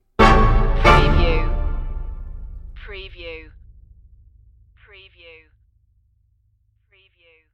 lightly macabre stinger

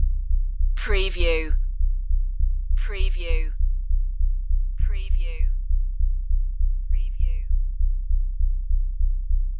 tension pulse loop

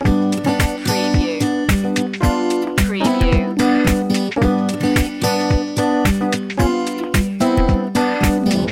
electro strum loop

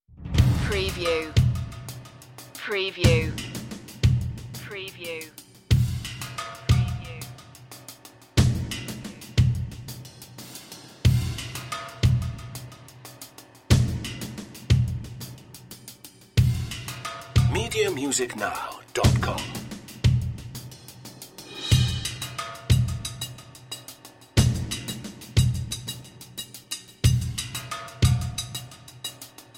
percussion only version

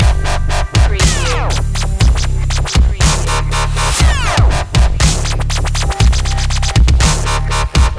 break beater loop

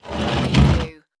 Wood Box Drag Short; Sound Effects Bible

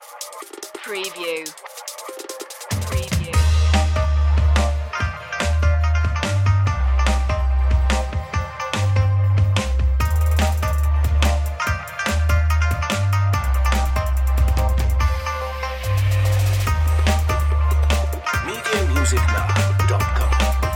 india charm

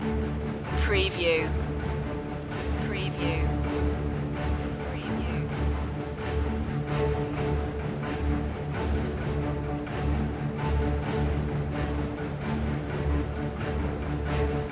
epic medieval tale loop

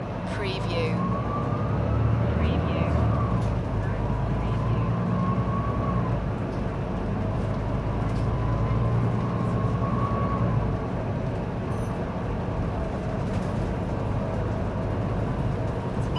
bus ride singapore loop

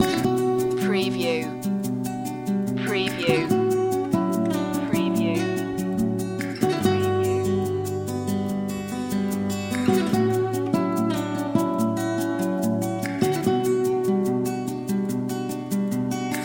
flamenco etchings loop

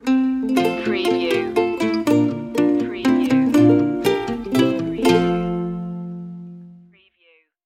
we wish you a merry christmas ukulele stinger

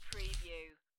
Dice Grab Collect Two Dice From Felt

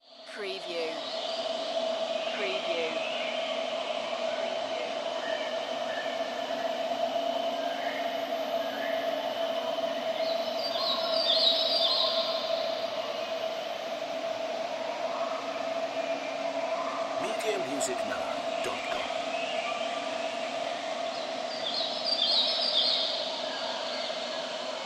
Enchanted Forest; sound-effects-bible

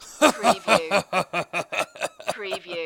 Laugh Male, Laughter, Laughing Male

